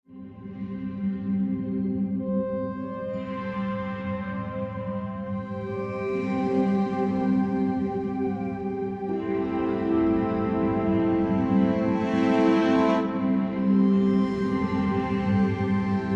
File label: music